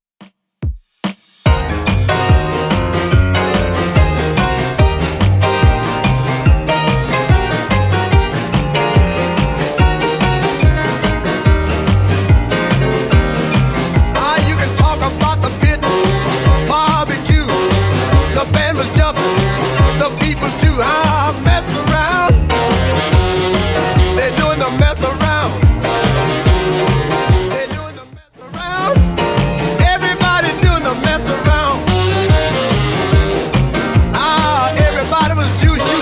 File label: 50s Redrum 144bpm